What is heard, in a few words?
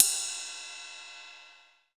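cymbal ride, drums; East West; Global Sounds; RY30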